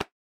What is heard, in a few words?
shoulder touch